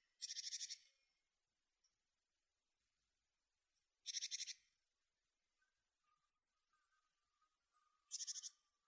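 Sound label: Japanese tit call note; shijukara jinaki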